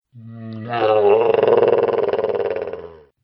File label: Dinosaur Unhappy; animal; Dinosaur Sound Effects